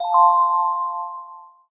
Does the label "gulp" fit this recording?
No